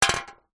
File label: food drink soda can empty set down concrete ground